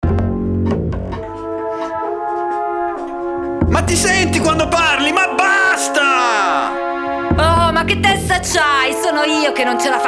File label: Jingle